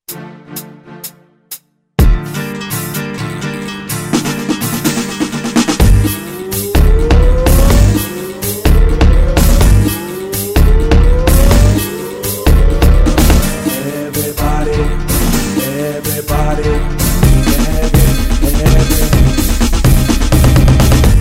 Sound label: Riddim